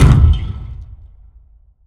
DAMAGE alien Ruins Wall; Barotrauma; Room FX

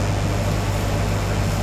plasma Cutter, Tools; Subsurface; Barotrauma

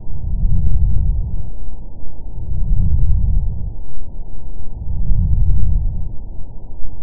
stalker breathe; Barotrauma; Barotraumatic; NightmareCreatures

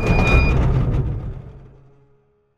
structure crunch; Barotrauma; Subsurface; Damage

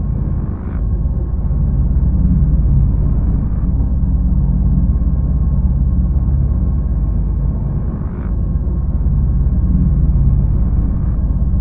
reactor fusion small; AA Dangerous Meltdown - EK Compatible

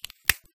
bone break; Barotrauma; Neurotrauma

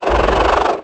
crawler idle; Barotraumatic; Cthulhu; InsanityMonsters; Hallucination1

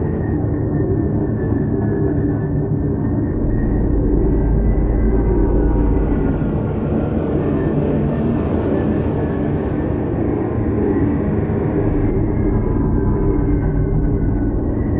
reactor fusion medium; AA Dangerous Meltdown - EK Compatible

